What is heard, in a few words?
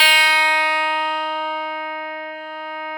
Clavinet D2; Classical Prepared Pianos; Total Piano